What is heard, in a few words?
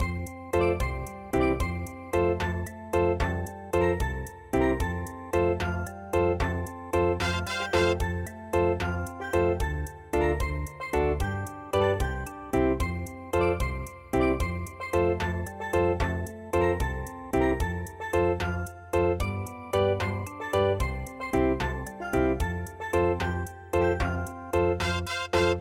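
reggae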